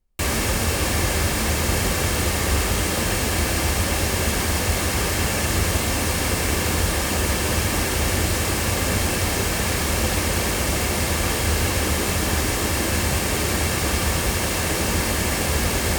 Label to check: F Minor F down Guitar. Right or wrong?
Wrong